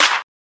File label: urban trap snare; Urban Trap Kit; Hip Hop; STYLE KITS (Hip Hop, Etc)